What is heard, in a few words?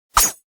needles